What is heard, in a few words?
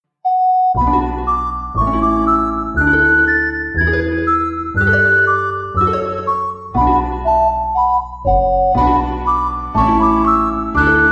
Tranquil